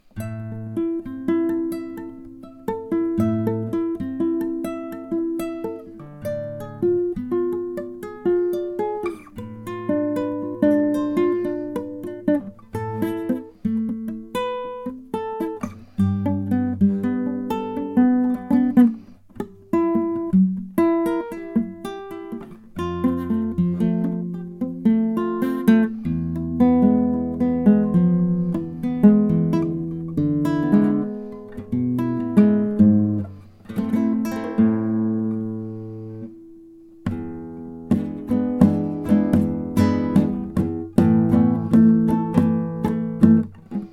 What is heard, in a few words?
farruca